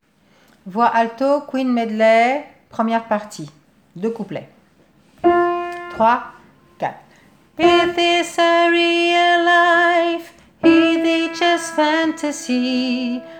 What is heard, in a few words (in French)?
voix alto